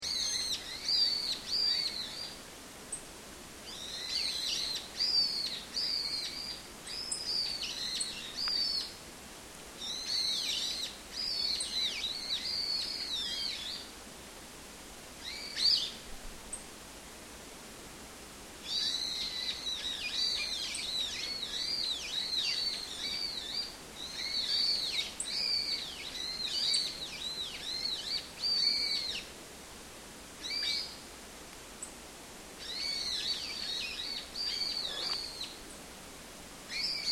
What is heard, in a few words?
AMBIENCE FOREST BIRDS LOOP, Japan; Ambiance recorded in Sannohe Japan; Forests and Jungles